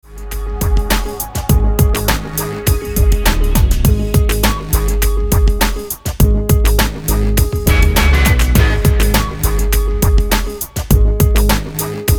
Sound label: instrumental version